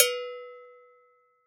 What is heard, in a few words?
Cowbell Chrome; World Percussion; Cymatics; Emperor World Percussion